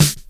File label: SD, dance drums hip